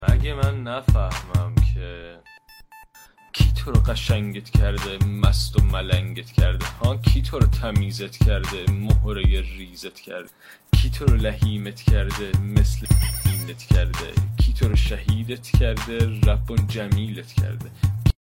fun music

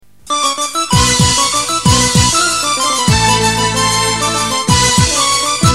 music torki farsi tarkibi

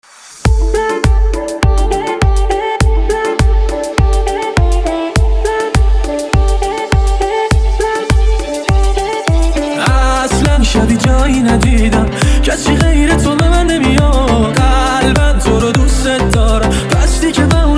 music shad remix

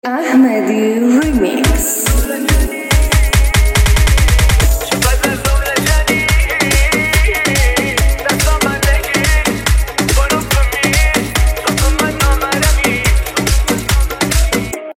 music shad car

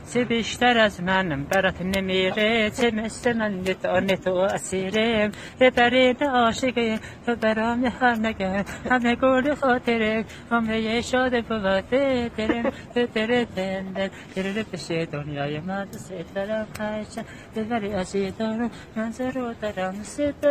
fun music

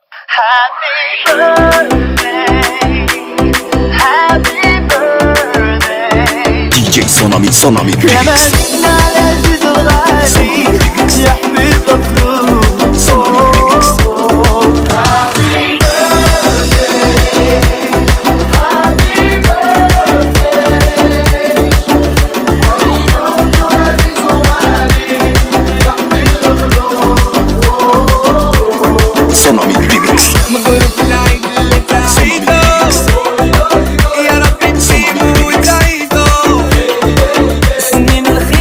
happy birthday remix

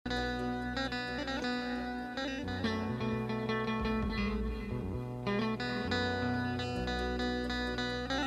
music shooti kordi

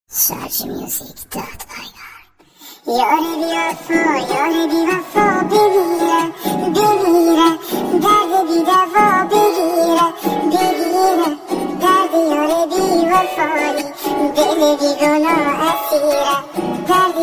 music afghani

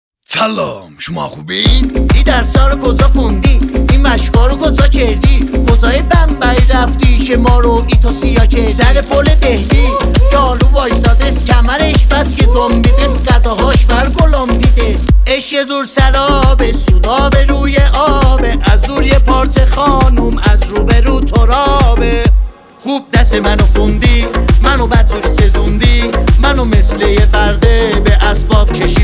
fun music

